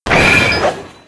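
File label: Mob attack; SubBossmob; 욱일승천류; 미츠코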